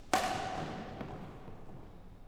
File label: Badminton